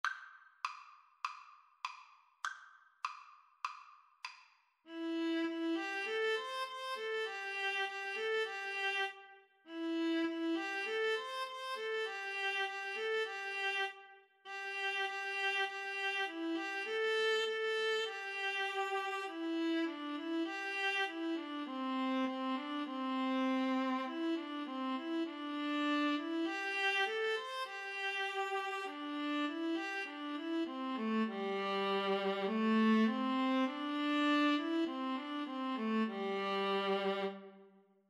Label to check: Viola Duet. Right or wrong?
Right